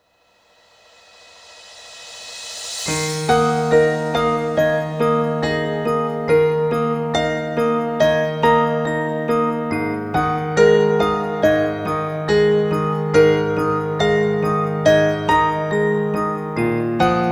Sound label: Failure; Polyphonica